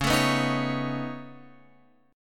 Db 7th Suspended 2nd Sharp 5th Db, down, Guitar Open F; strummed; JGuitar